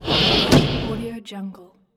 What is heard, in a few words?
automatic door open